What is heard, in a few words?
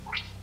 fart; taskmaster